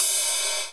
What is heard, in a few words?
Ride Cymbal